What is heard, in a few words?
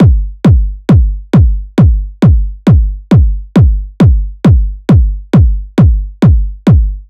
Kick Loop 135, Techno Drums